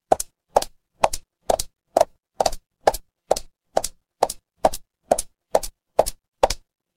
footsteps heels

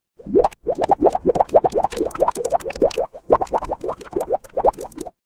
water bubble

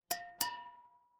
glass clink

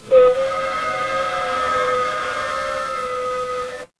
mechanical engine

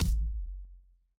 retro game hit

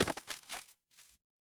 footsteps single

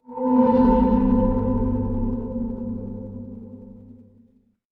magic scifi alien

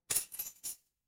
glass shatter

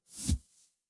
ui delete

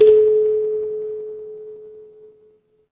instrument kalimba

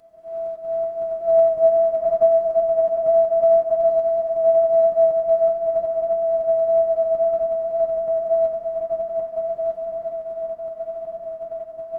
ambience forest

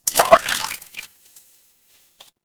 water splash

